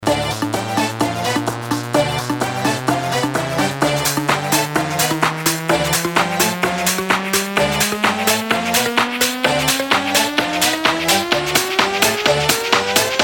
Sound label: Latin House Original Mix bpm 128